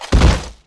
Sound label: die